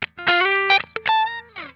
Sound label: GLIDE, Funk Guitar; Zero G - Funk Guitar